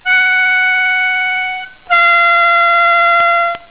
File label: 6 draw, diatonic bending; Hole 6 Draw Bend